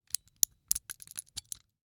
Rachet, vanadium steel, 8in, standard, locking, clicking, mechanical, new, socket release, rotate; Eiravaein Works; Vaeyan IV